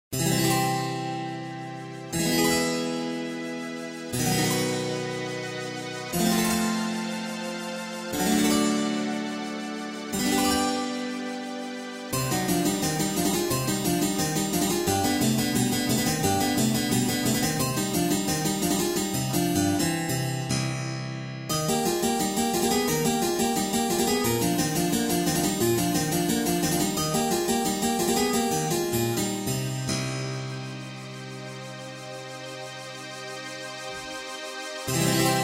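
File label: Temperament, Pianoteq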